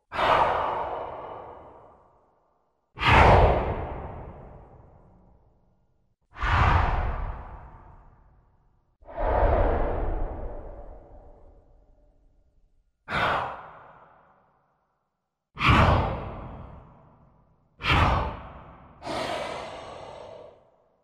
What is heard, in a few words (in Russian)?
korotkie dyhanija prividenij